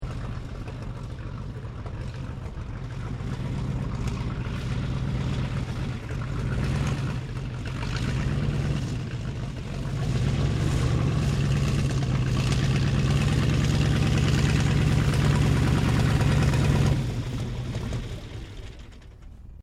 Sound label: Aircraft Sopwith Pup replica taxi shutdown, Replica of a WW1 Sopwith Pup; 1916; Airplanes